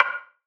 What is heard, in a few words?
Perc; Japan; Cymatics; PHARAOH Beta Pack; Percussion